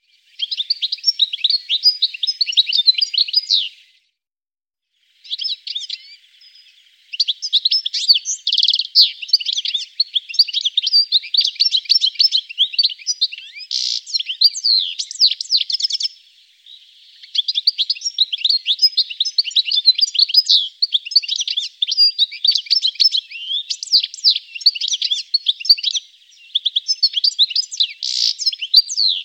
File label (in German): Stieglitz